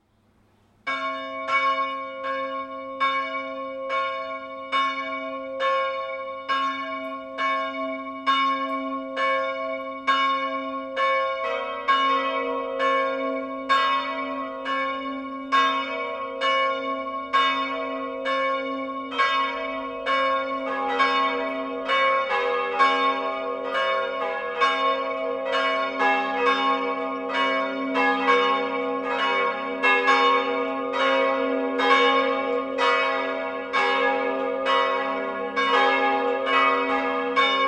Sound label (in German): Glocken ev Kirche